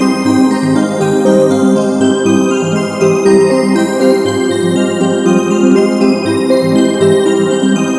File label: BGM AFTER